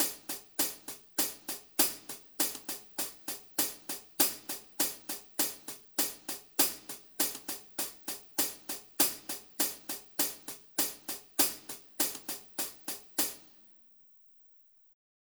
Wave Drum SEP HH

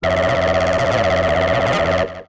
Synth Mono

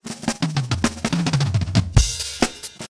Drum Solo

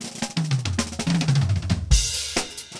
Drum Solo

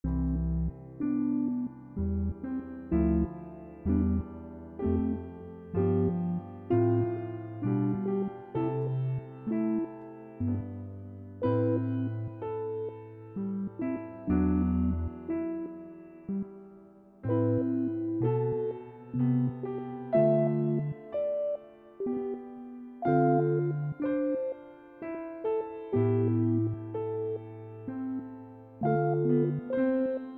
Sync Sine Soni

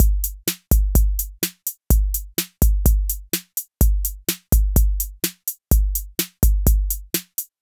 Techno Drum MIX

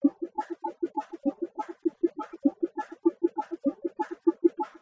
Bongo

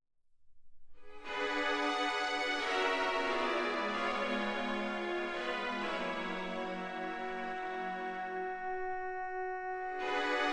Sync Wavetable Soni

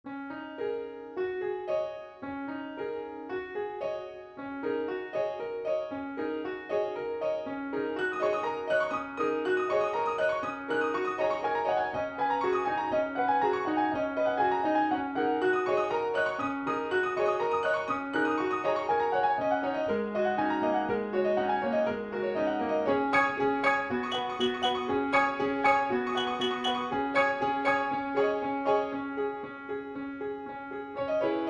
Sync Wavetable Soni

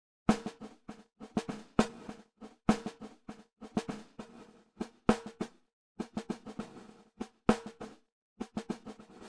Wave Drum SEP SD; Decomp Mag Mix Phase TR

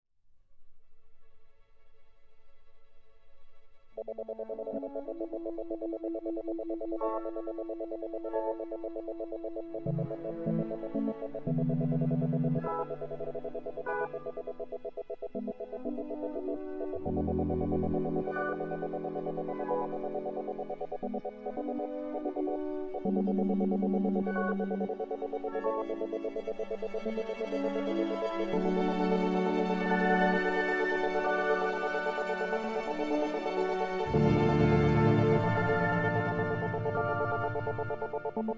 Sync Sine Soni

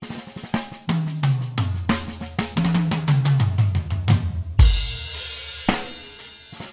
Drum Solo; TSMtoolbox

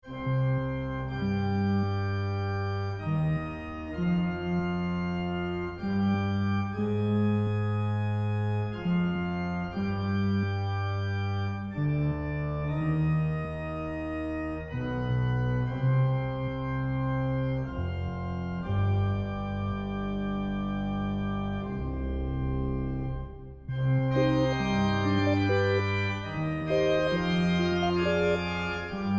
Sync Sine Soni

